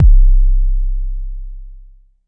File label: Jomox MBase, drum machine; Jomox MBase 01